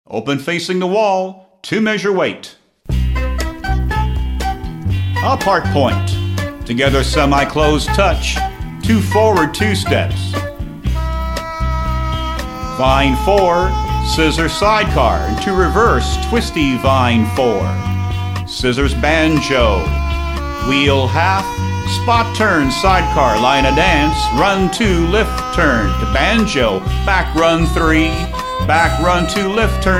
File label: vocal sample